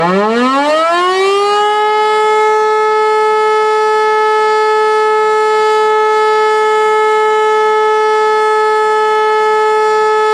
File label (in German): Probealarm